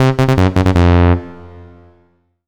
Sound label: MUSIC EFFECT Solo Clean Sawtooth Negative; startide macos switch windows gamerip 2017